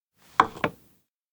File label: pizza oven take plate place; anemoi